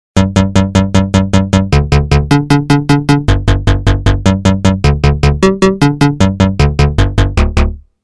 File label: Mute bass